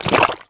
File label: Whale Burst; Finding Nemo; XBOX